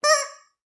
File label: Squeaky Toy; SoundsCrate